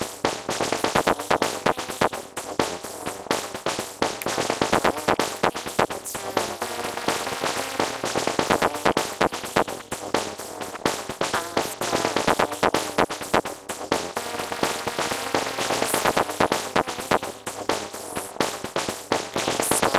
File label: celebrate percussive